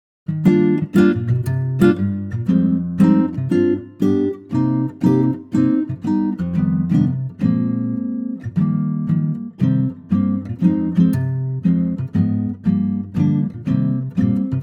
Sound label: Ac guitar Eb